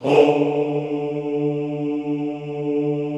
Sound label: "houuu" D2, classical choir; Best Service; Extended Classical Choir